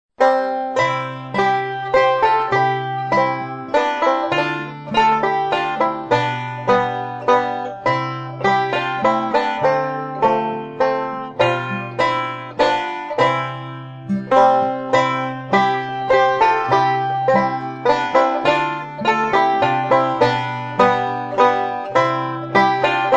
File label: Ash Grove, banjo